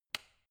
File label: switch turn on